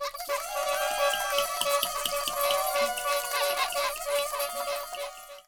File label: party funhorns; Cartoon - film effects